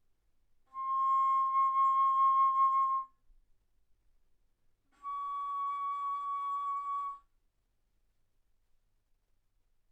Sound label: Bass Flute C6 Db6; ff